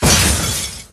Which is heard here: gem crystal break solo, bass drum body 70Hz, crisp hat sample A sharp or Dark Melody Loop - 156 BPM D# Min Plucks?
gem crystal break solo